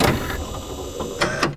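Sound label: door open; Cosmic Rage; Pod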